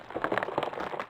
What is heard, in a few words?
Golem